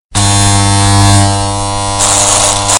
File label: High Voltage Tone